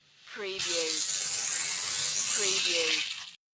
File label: Scifi Production Element - Narrow Slide